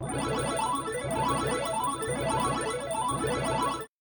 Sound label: game complete effect